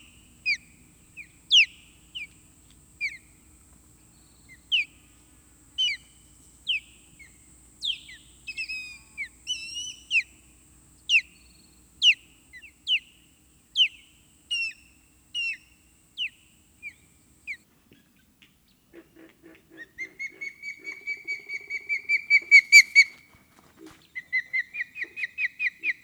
Osprey; Park

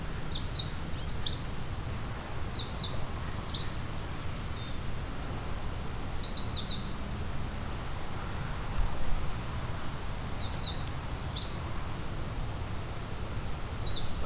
Yellow Warbler; Park; Andrea Cornelius

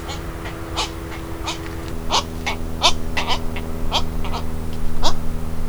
Black-crowned night-heron; Escondido Creek Watershed; Andrea Cornelius

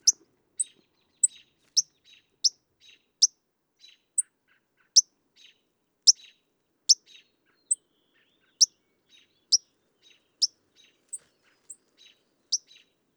White Crowned Sparrow; Whie Crowned Sparrow

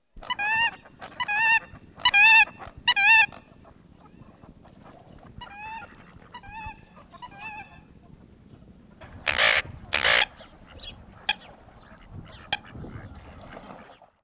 American Coot; Park

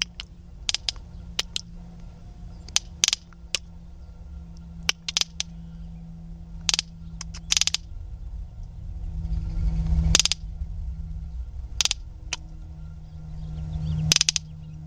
Roadrunner Clicking; bird; Park

